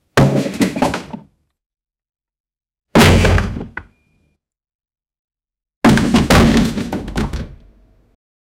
in angre a man clears; fight

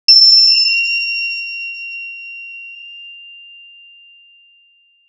game combo sound; creat a game combo sound; sound effect

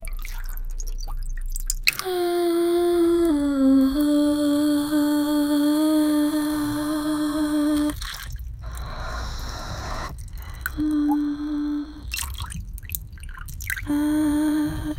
female lightly waking, spa

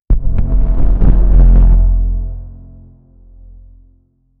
divine entrance, celestial

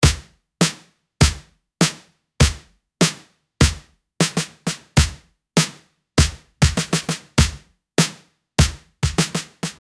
snare drum from a funk, disco

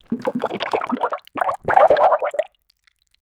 wet bubbly gurgles

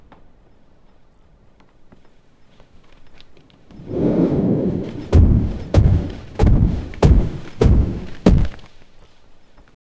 footsteps running grabbing, dramatic

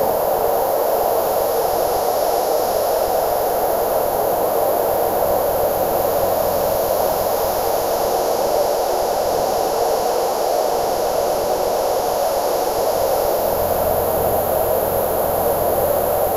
create atmospheric highla, light breeze

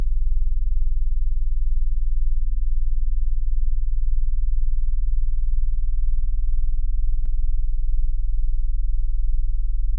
deep ambient hum; clock

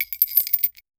arpg ui equip chainmail

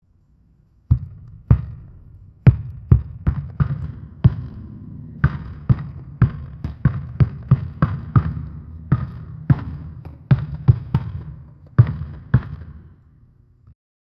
basketball dribbling

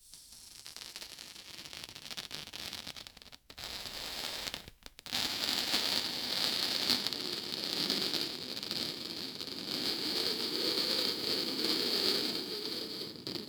belly expansion with ball

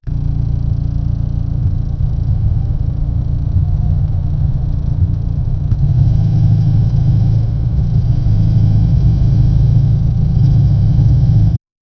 create a sound where the, engine sounds

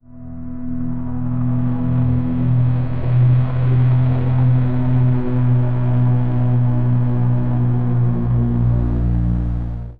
fpv drone noise coming

